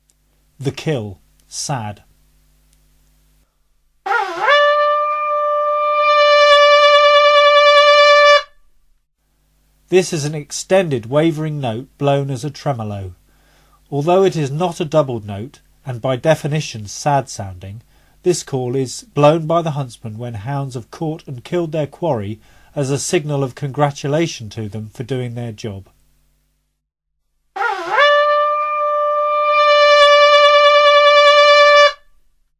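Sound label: the kill, hunting horn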